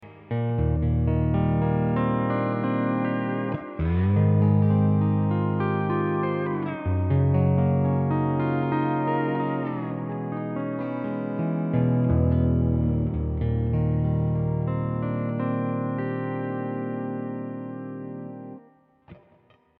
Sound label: hawaiian piece